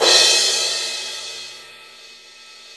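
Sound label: cymbal crash; Sound & Vision - Gigapack I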